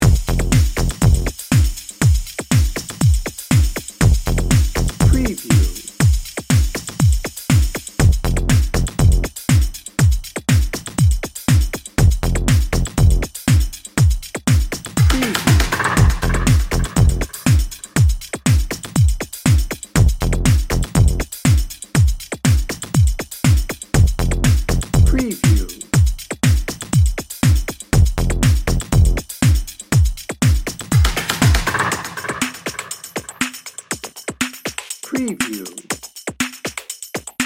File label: dance party dnb